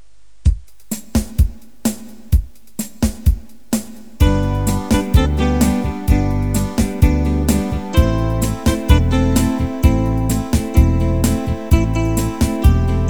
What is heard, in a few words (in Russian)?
Muzykalnaya shkatulka